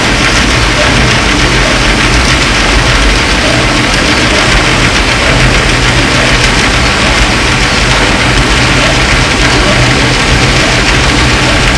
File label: Hydrophones, orcasound